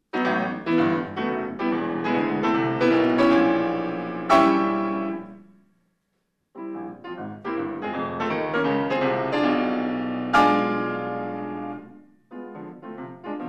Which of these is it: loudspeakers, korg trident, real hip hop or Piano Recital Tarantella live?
Piano Recital Tarantella live